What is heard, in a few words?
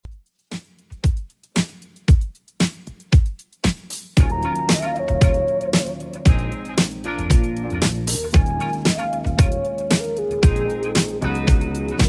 70s Redrum 115bpm